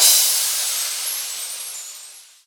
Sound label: soft hit finish; osu